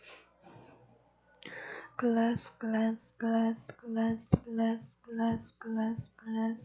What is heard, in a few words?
glass